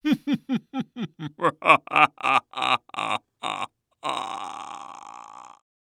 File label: Horror Scary Human Male Laugh Evil Vampire Laugh